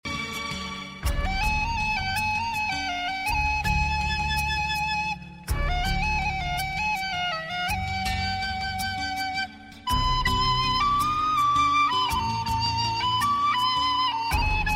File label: flute